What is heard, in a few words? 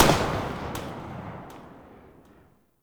Viper Fire Tail, Weapons; Warframe; Vipers